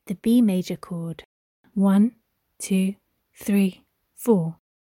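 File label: organ, B major chord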